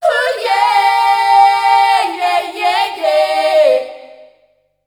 FEMALE CHOIR, Dance Vocals; Best Service ProSamples vol.12; AKAI

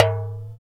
Dumbek; Roland LCDP14 Africa VOL-2; Af.Hand Drm2; N.Afr.Dumbek

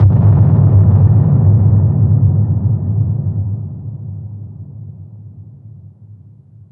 thunderous, spacey drums; Spectrasonic Distorted Reality 1

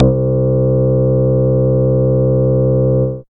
Moog Bass, Dance Synths

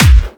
Clubby Kicks, Bassdrums

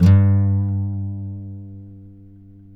FINGER, Guitars; TAKA

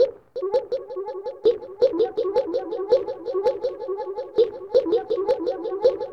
WEIRD RHTM; ETHNIC; AMG; Global Trance Mission VOL-2

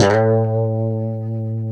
A 2, Guitars; Spectrasonics; Hans Zimmer Guitars VOL-1; 90's Sample CDs; COMP POP+VIB

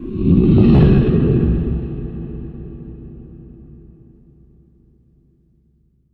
IMP WHOOSH; Cinematic Impact

